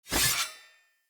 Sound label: Knight Axe Whoosh Stab; Weapons; Enemy; Axes; Snc Knight Axe